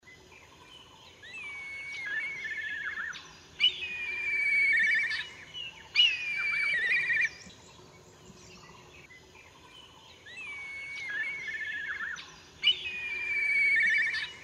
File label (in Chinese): 黑鳶飛鳴; 老鷹; 鷹科/留鳥